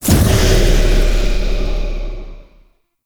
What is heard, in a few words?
Void Portal Close; Warframe; Missions; Zariman; Zariman Assassinate